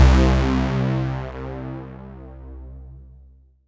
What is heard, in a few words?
C3, synth